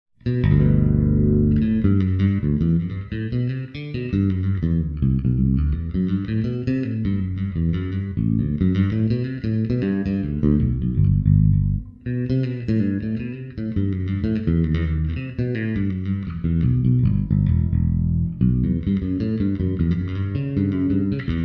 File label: allemande processed, bass